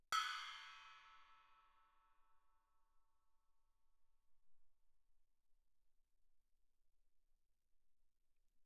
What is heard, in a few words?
splash stick bell mf, suspended cymbals; Percussion